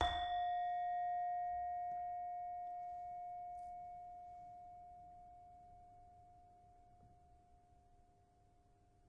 Saron, Indonesian Saron; Multisampled; WORLD

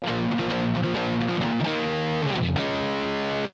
badrock, guitars

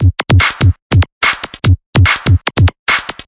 146 electro normal; REMIXABLE COLLECTIONS; Kraddy Collection

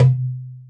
arabic perc, Arabic Percussion Kit

